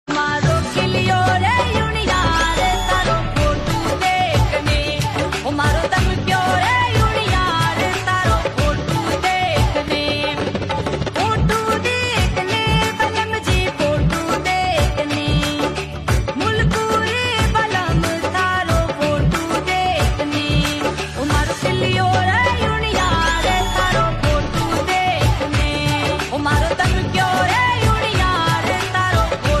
Ringtone

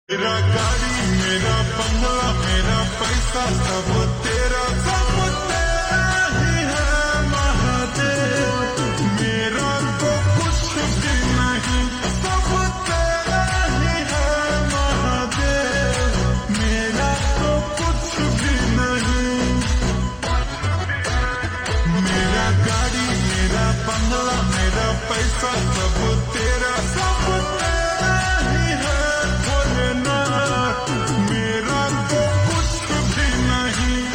Ringtone Slowed Reverb

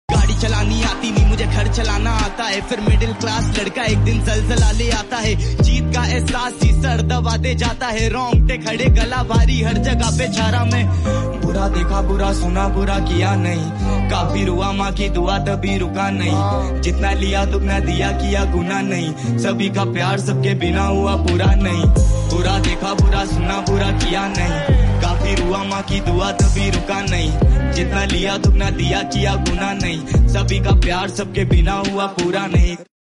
Ringtone

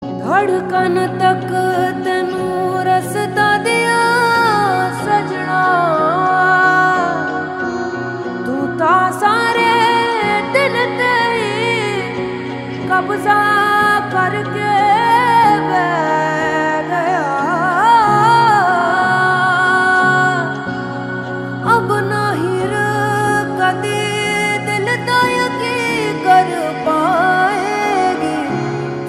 Ringtone

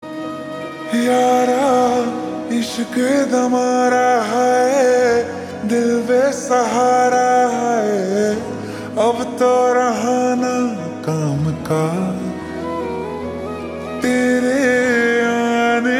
Slowed Reverb Ringtone

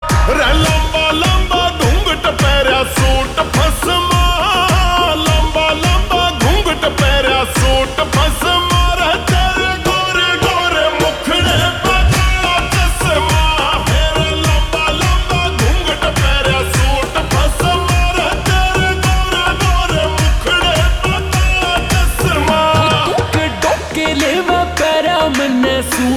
Slowed Reverb Ringtone

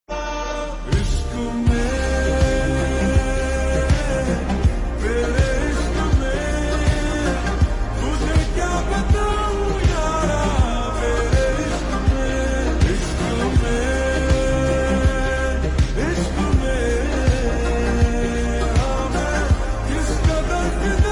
Slowed Reverb Ringtone

